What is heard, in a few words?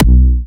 Harder B4, Big Room Kicks